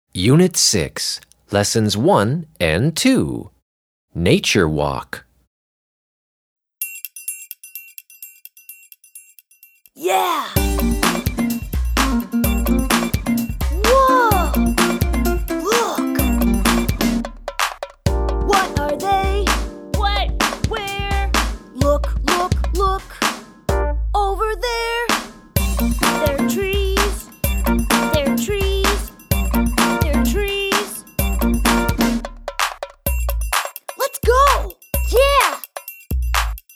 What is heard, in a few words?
Song Nature Walk; Student Book 1